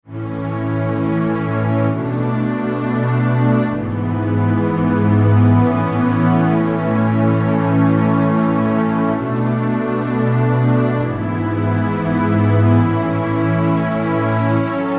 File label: Underscore PAD; Worship Flow